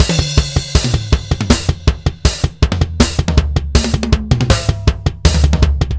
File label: Wild Drums